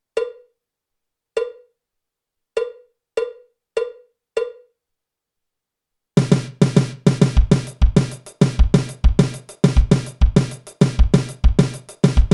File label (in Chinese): 架子鼓独奏 爵士融合; 66条架子鼓独奏SOLO